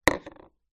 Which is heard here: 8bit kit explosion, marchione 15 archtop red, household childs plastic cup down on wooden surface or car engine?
household childs plastic cup down on wooden surface